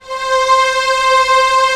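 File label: STR C0 FF, strings; Sound On Sound - Peter Siedleczek's Orchestra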